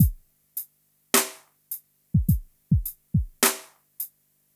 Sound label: Smooth, R&B Drums